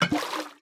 fill, bottle; Minecraft

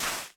suspicious sand place; minecraft; block

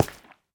coral, step; minecraft

